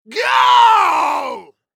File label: Horror Scary Human Male Caveman Painful Scream